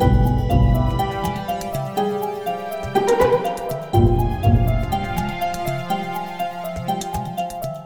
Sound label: Somber; Age of Wushu; Windows